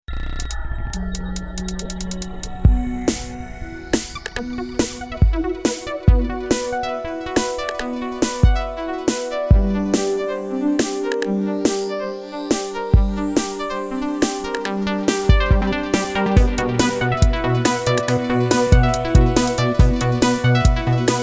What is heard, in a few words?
Harpsichord And Noise Of The Marine Waves